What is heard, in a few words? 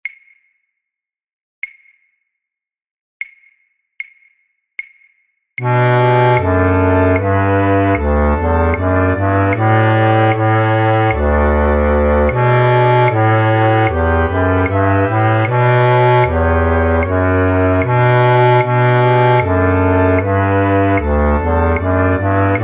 flute choir, Contrabasfluit; voor oefentracks; Matthaus